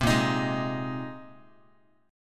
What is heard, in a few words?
A sharp 7th Suspended 2nd Suspended 4th, down, Guitar; strummed; 3,6,6,6,x,x; chord; JGuitar